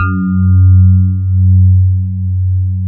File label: DX ROADZ SFT, FM Paired Tines; E-MU Producer Series Vol. 2 – More Studio Essentials; Keyboards